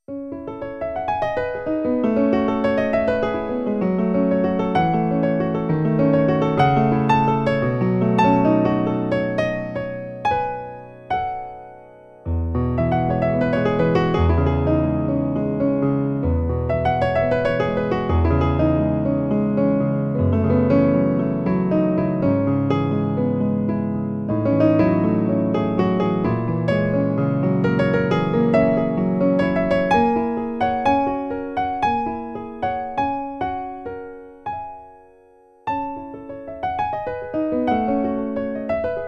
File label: Debussy Arabesque, Piano